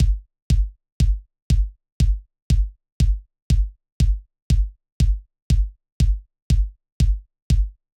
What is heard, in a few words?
Kick